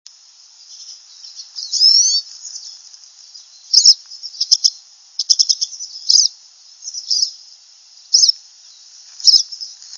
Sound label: siskin pine making goldfinch like sounds; birdsongs